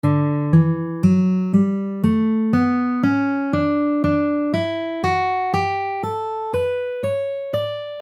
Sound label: D Ionian Modes